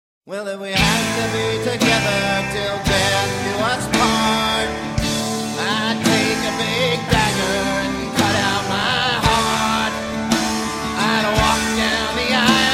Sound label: Short Song